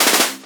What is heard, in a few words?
machine gun short; Crash Bang Boom